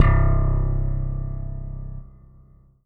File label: Roland U-220 Low Slap Bass C3